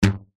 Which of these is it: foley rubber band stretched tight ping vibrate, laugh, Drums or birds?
foley rubber band stretched tight ping vibrate